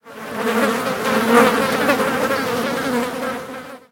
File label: fly, outdoor ambient